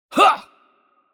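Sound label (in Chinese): Attack Heavy, Chinese; bloom minigame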